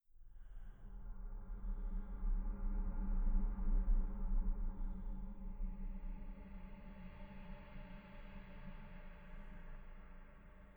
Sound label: Main Ambience